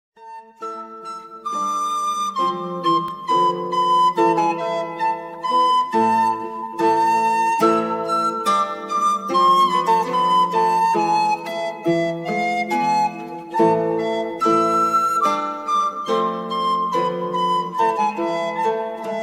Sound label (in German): Renaissance Floete Laute